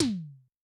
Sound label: Electrc H, Tom, Yamaha DTXpress-II